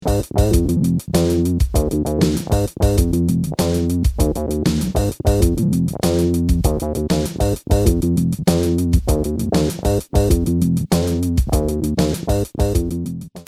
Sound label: Percussive Pulse And Drum Track, guitar synth